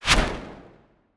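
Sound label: Impaler Fire Swoosh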